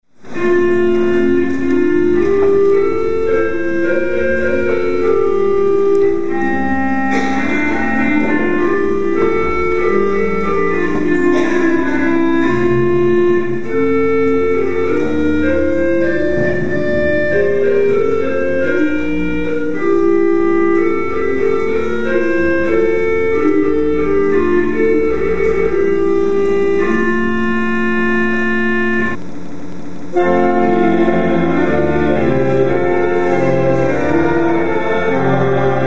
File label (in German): vorspiel liedbegleitung, kirchenorgel